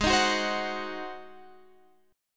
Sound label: G sharp 7th G sharp, Guitar Half Step Down